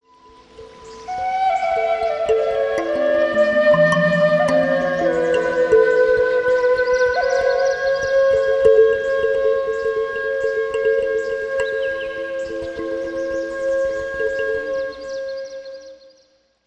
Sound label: Flute; PassionFlute